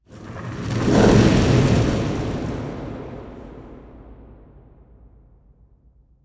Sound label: ambient fireball flyby; Portal 2